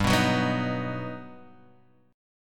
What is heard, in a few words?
G Augmented Major 7th, Guitar, Half Step Down